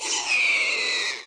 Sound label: scrm